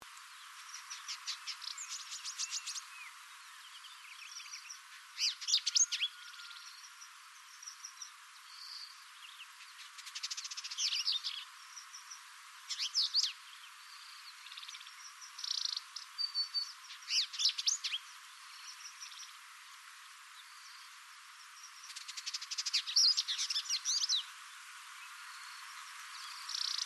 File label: Vidua purpurascens; Indigobird Song